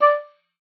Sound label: D5, OBOE; Xsample VOL-6